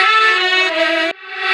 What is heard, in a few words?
Back Sax Effect; Sonic Foundry (Sony Creative Software); Jazz Solos and Sections; Effected Sax